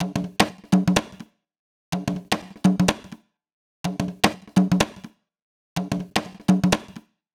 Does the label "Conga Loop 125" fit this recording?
Yes